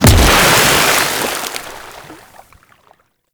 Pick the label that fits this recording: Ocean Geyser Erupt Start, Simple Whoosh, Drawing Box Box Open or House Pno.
Ocean Geyser Erupt Start